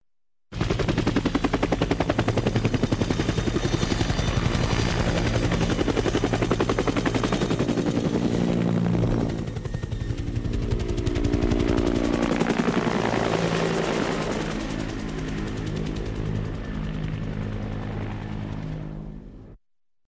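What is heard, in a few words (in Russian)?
вертолет винтовой кружит над головой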